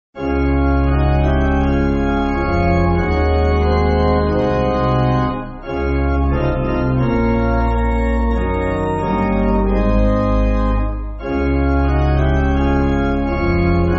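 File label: Pipe, Pipe Organ, Music Backing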